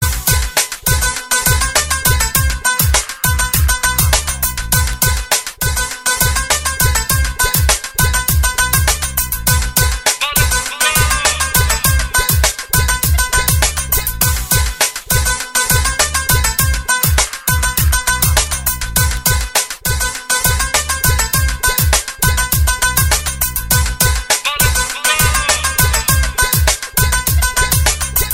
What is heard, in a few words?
Albanian Hip Hop